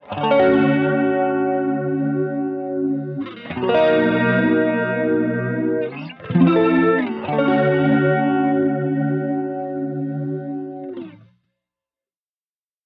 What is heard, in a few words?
Guitar; Instant Flavor; H90